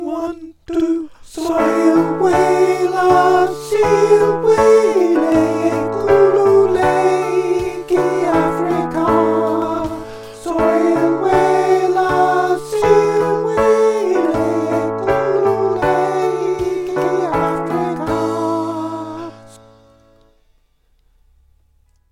alto voice drums

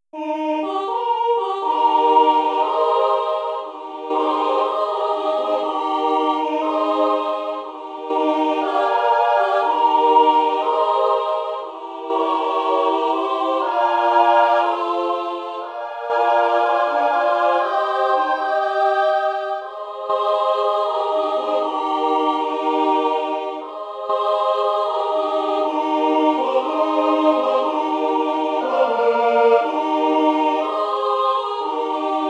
all parts plus chords

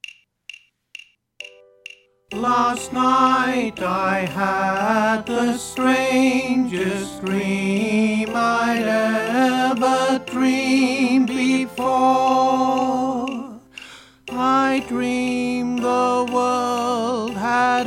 tenor

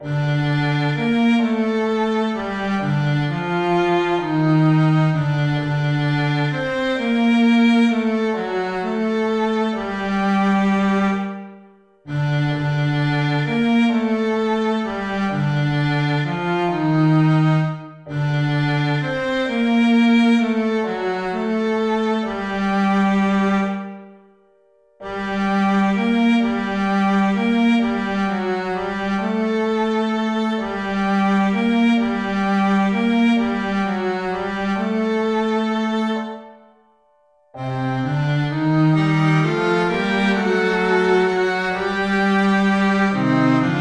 Gm strings

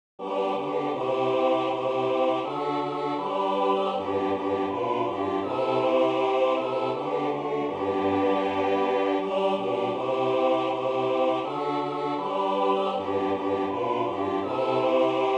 soyilwela all parts

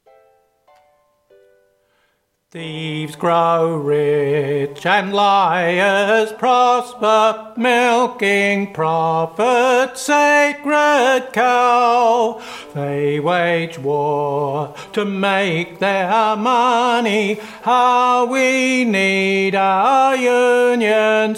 Tenor Sung

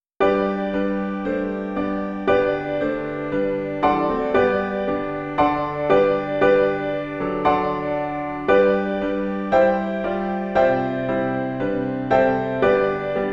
ode to joy

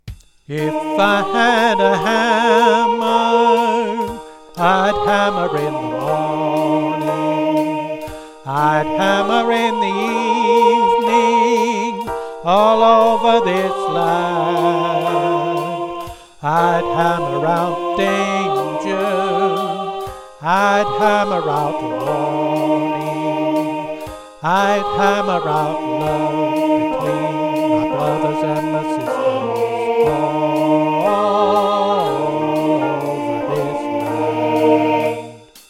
swing alto

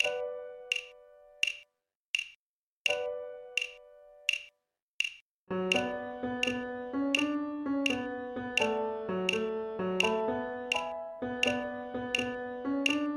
Bass With Click Track

